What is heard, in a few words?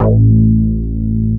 moog A; Techno Trance Essentials